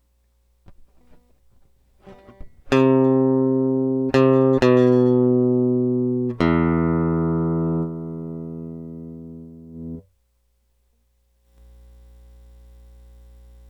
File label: Elect Guitar